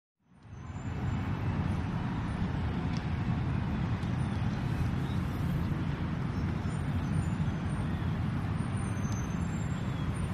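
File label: Large City Park Ambience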